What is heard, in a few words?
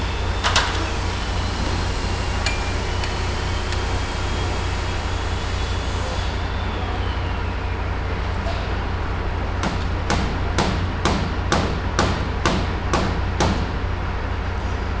Construction Site Background Noise; blind source speech separation corpus; BSS